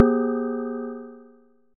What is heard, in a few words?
impact Bell heavy; Kenney's Sound Pack; Impact Sounds